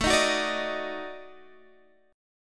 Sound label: Eb Minor Major 7th Flat 5th